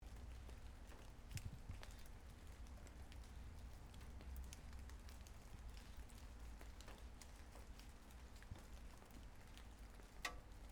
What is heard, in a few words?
light rain birds plane; Light rain, birds and plane, Draguignan.